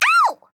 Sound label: Grunt Hit; Rider